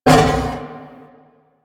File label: Wolf Attack Short; Animals; DE; Warframe; Quests; Duviri